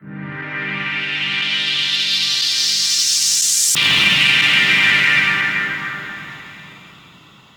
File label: Melody, IDM